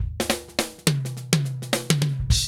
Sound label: FILL, Funky Ass Loops